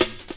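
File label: snare loop, break beats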